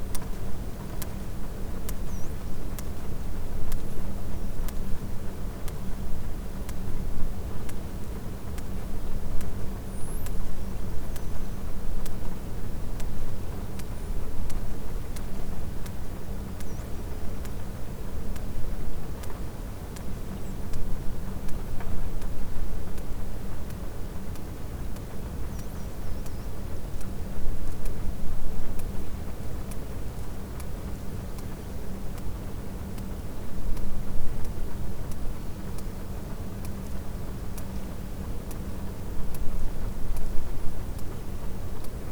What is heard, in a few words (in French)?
cachalot positif, Physeter Macrocephalus